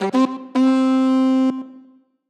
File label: call, goat horn; Minecraft